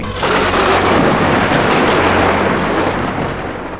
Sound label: lightnin; cambodia